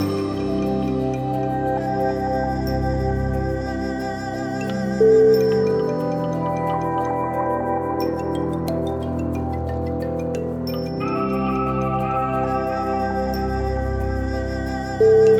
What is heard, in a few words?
ambient of asia